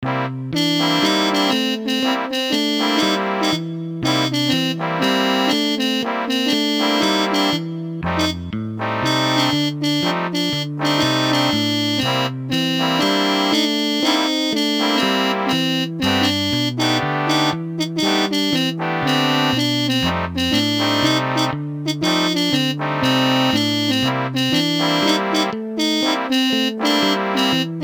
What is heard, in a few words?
MIDI blues